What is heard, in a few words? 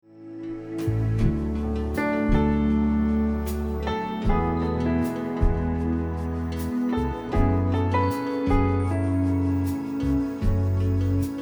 instrumental